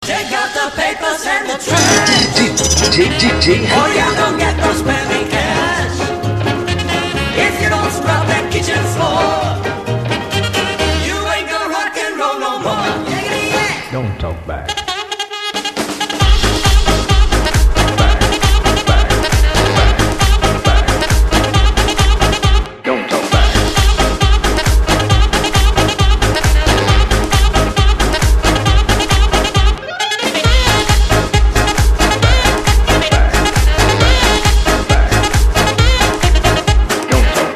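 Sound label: electro house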